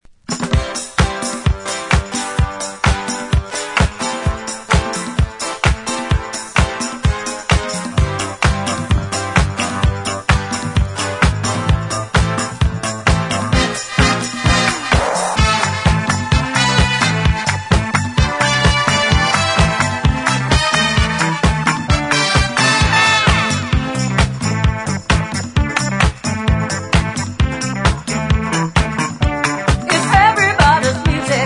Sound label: melody